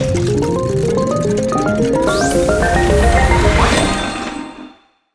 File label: gacha short seq; Just Dance 2019